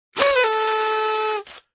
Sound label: party, whistles; magicworld